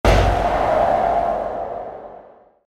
Rush; cappuchino assassino

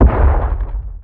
footstep; brainrot; italian brainrot 3; roshan

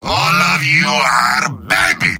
yell; heavy; mvm

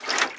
throwable slosh; weapons; breadmonster

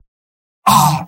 pain sharp; spy; mvm

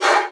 throwable grunt; weapons; breadmonster

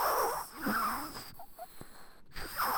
critter idle breathing loop; nightmare criters

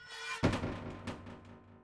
shutter; Garry's Mod; tf2

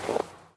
snow, footsteps; Garry's Mod; tf2

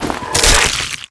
gloves on; weapons; breadmonster

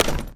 item default pickup

